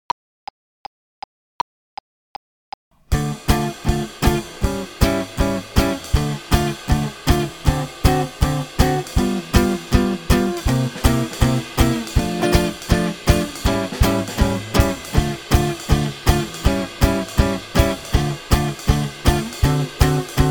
swing

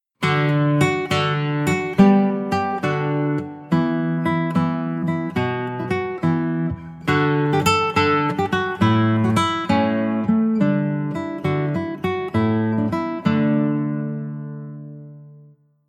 irish tune; william ballet

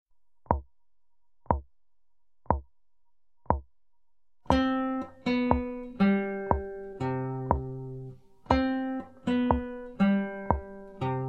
riff

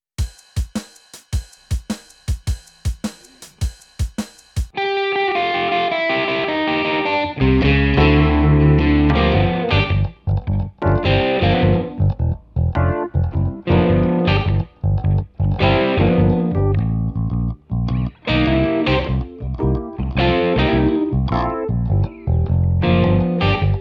blues play along

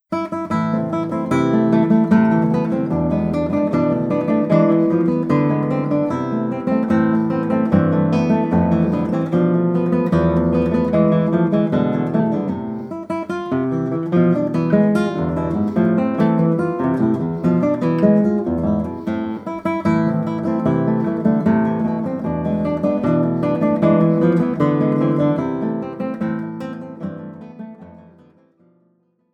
maracatu

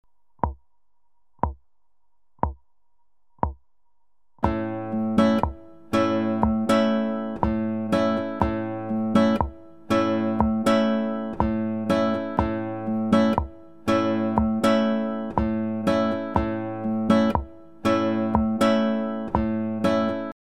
picking

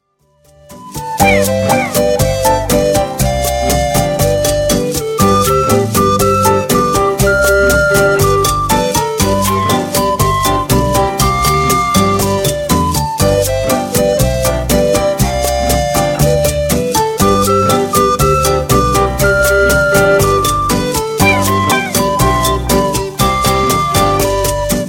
rhythm and syncopation

